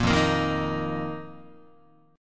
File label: F 7th Suspended 4th F down Guitar; strummed; JGuitar